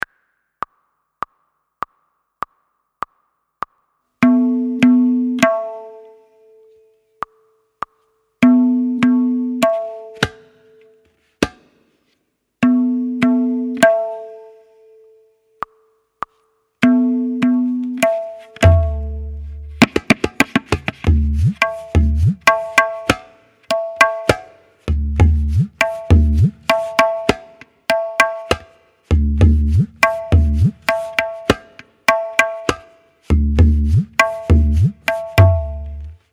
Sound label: Tabla Solo